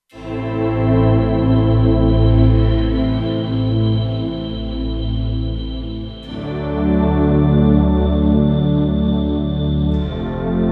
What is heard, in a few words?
Fairlite Stack, Kurzweil K2500X